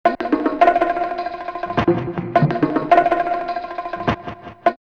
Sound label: Arabicum 104, drumloops OldSchool; X-Static Goldmine